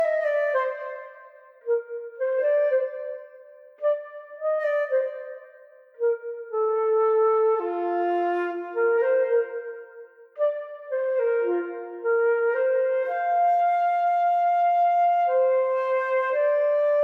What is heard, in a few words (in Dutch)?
Fluit; Toki Tori 2